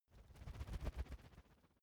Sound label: feather flapping quick; kreaturen